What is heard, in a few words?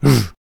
Stoner Attack Vocals